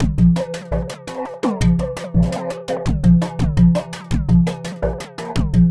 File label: Electronica Drumloop; Drums